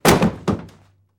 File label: Body Falls On Crate